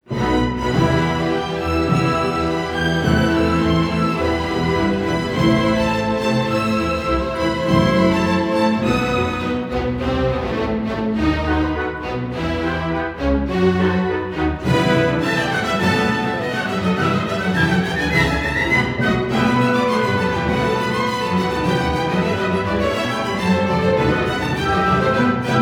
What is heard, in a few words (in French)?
concerto pour violon et orchestre